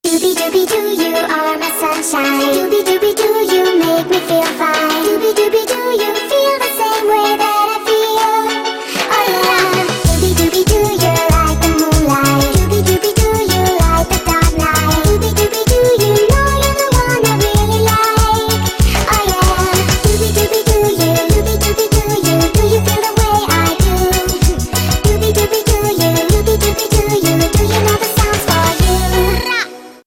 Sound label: Mobile ringtone